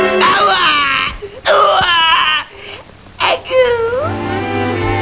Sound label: cry; Tom en Jerry